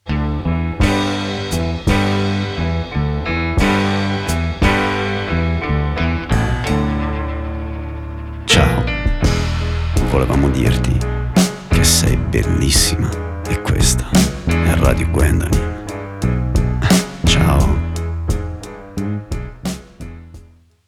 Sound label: Jingle; Radio Gwen striptease